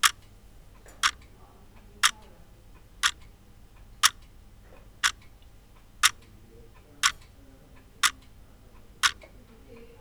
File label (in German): Wecker; DR-100; FetHead